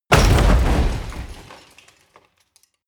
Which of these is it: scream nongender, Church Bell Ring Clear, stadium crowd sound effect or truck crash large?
truck crash large